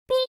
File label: beep; One Night Hot Springs